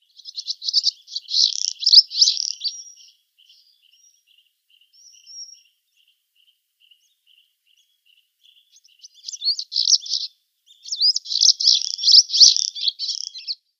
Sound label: Wire-tailed Swallow song